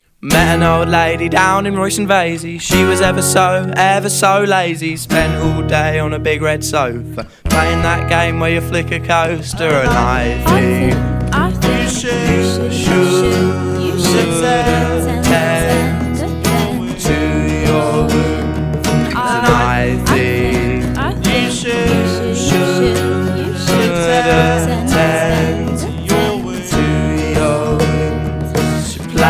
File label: Coaster Game (Acoustic)